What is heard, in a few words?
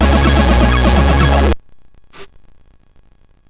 Tube Madness, CSG8580R5